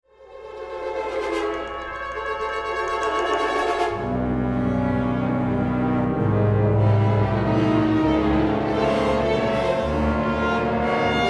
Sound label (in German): Finale Drama rhythmisch